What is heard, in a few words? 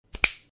Double Snap